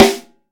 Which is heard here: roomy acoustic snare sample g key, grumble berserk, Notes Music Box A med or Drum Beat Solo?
roomy acoustic snare sample g key